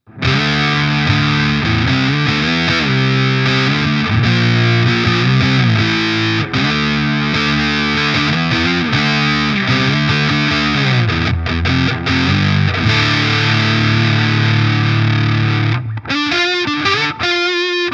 Electro-Harmonix Sovtek Big Muff Pi Fuzz Sustain full, midscoop